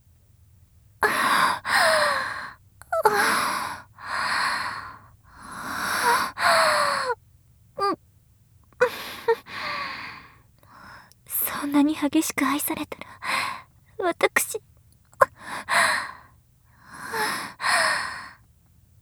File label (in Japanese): シチュ繋ぎパターン 吐息